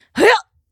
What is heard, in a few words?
Jump; Hollow Knight; God Tamer